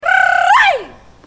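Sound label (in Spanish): grito, scream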